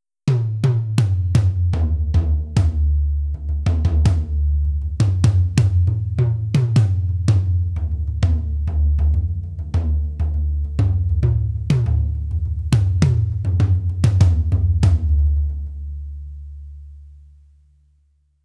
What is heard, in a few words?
no reso toms; professionally recorded acoustic drums